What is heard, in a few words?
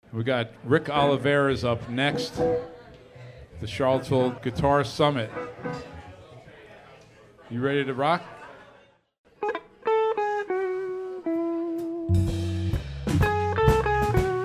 best live music broadcast guitar summit; wtju charlottesville